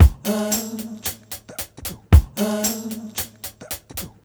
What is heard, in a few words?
BEAT -L, Roots Disco; USB Soundscan vol.32; AKAI